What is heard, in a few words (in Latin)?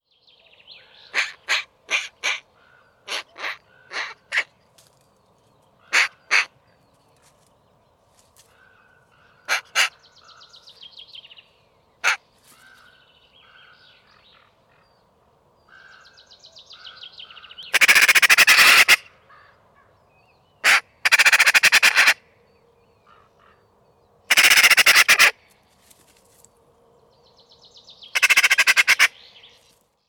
Pica pica; SETE